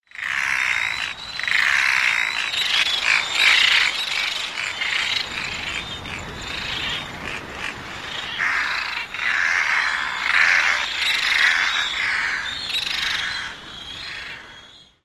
grating calls at colony; FIJI

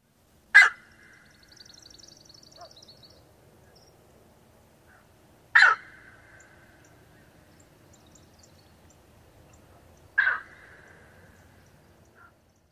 skow! calls flight

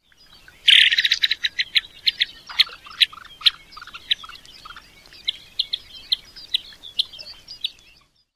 calls

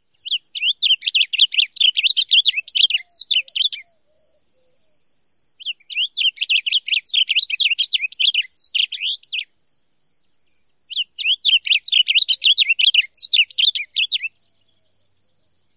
song

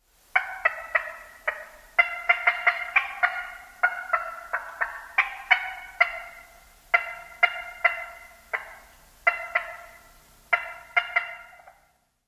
female alarm calls

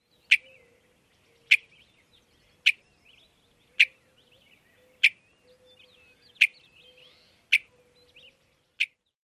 churts

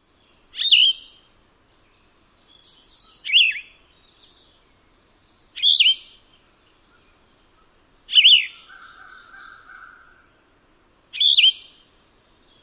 song

